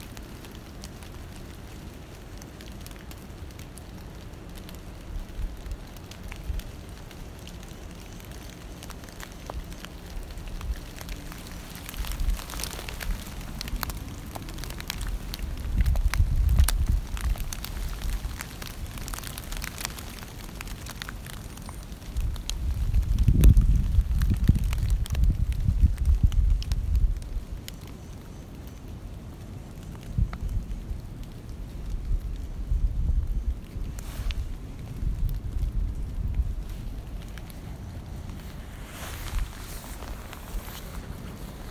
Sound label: Rain on the forest floor at night